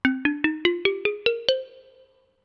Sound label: xylophone short